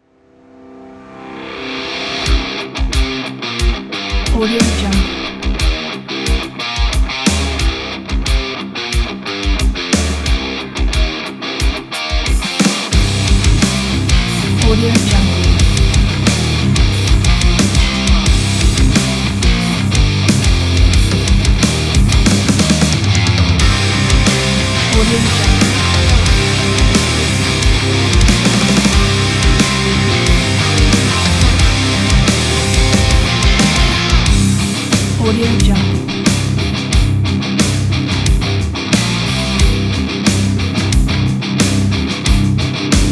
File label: heavy metal industry kit